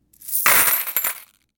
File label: Dropping Coins Into Plastic Container